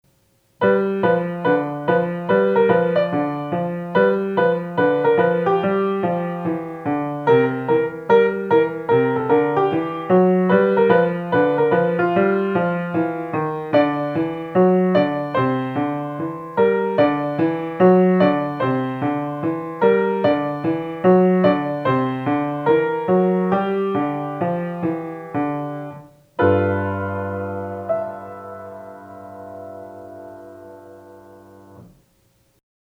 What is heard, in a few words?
Whistle The Blues, piano; Progressive Jazz Etudes for Piano Book 1